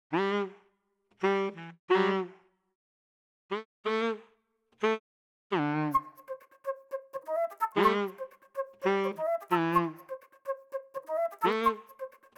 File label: flute windup